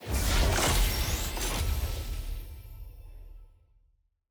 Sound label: loot reveal permanent rarity ultimate